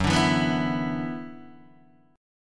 F sharp 7th Suspended 4th Sharp 5th F sharp down Guitar DADGAD